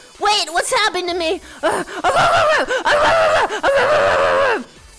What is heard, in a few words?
furry bark